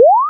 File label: on key